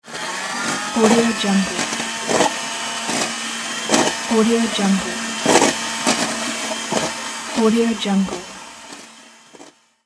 hand mixer; studio-j

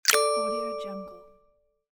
unlock

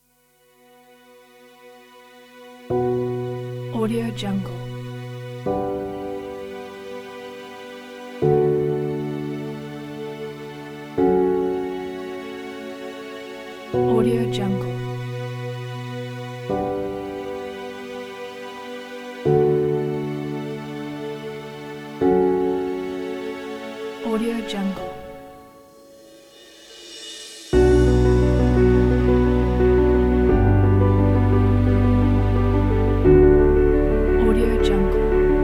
emotional cinematic piano and strings

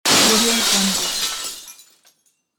window destruction

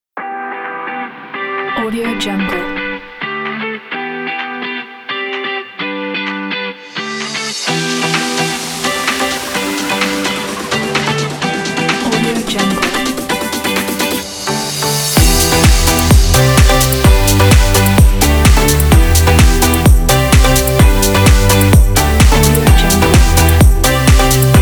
upbeat motivational summer pop

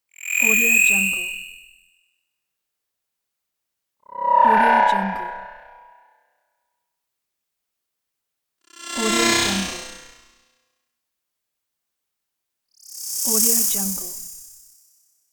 scan ambient; efxideas

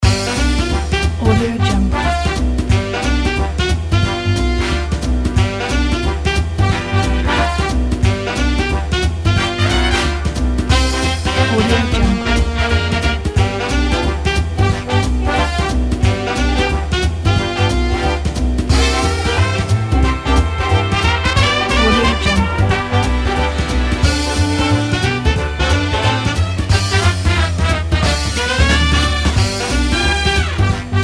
classic big band loop

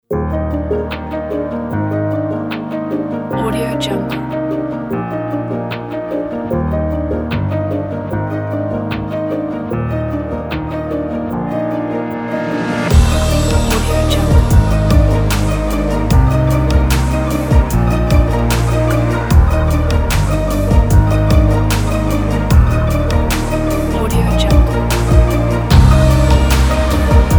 powerful emotional climax

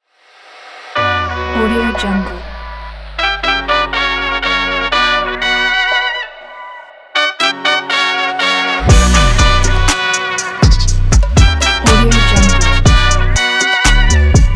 the hiphop

